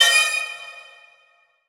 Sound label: Transition Hit Impact Metal; Production Element